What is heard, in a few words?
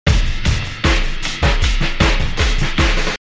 Sample Loop